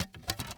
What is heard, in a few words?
cd jewel case open; prosoundfx